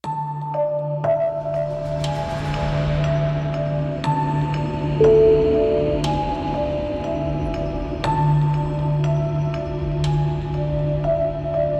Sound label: scary halloween night